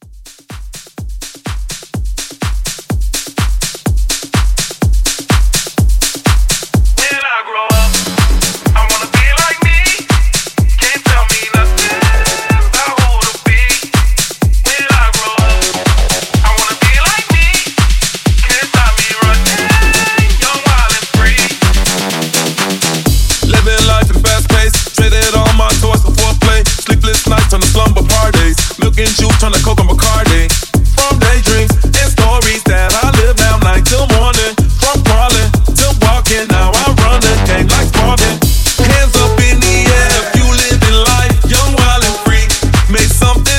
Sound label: Tech House Mashup 125